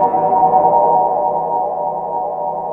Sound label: EERIE LOOP, AMBIENT LOOPS; Zero-G Ambient 1